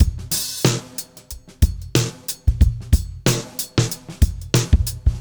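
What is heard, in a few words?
92 bpm, beat loops; Zero-G; Monster Beats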